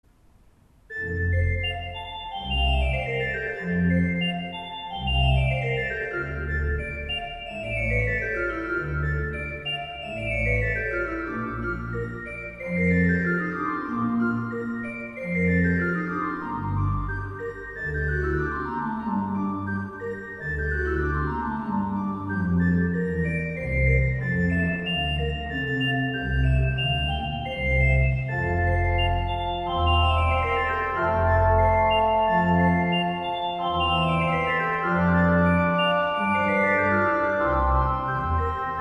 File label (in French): orgue; etoiles; Pachelbel